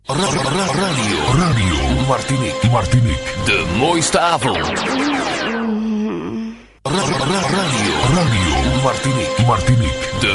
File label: jingles; Martinique Ham